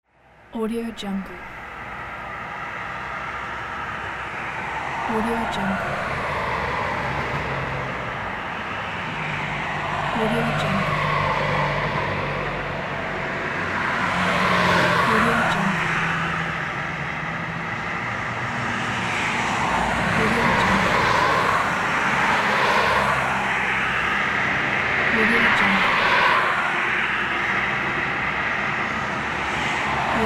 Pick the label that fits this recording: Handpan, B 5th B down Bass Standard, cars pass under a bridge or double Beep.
cars pass under a bridge